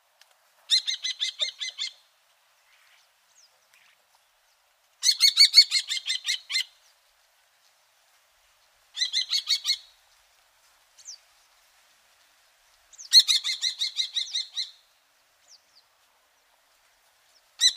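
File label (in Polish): pustułka zwyczajna falco tinnunculus tinnunculus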